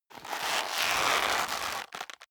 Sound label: leather creak hard